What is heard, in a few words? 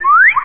SWOOZ